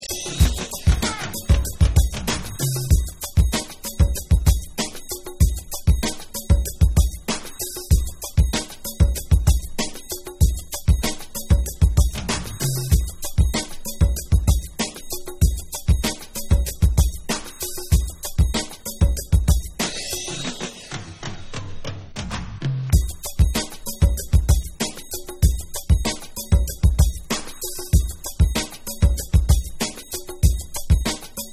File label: Bonus Beats